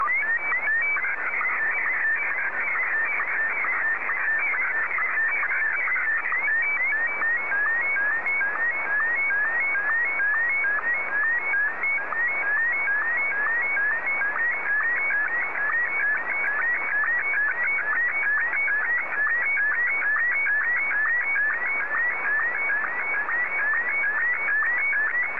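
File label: Martin 1, slow-scan television; JA3QJQ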